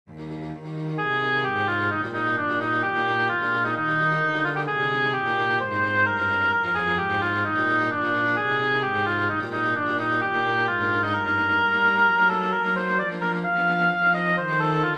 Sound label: presto arranged for oboe strings; mendelssohn bartholdy felix; lieder ohne worte